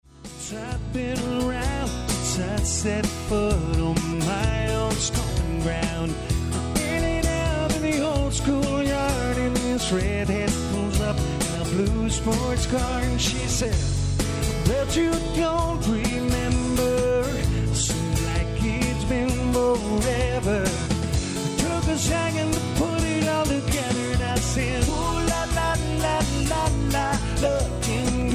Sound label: LIVE, Acoustic Guitar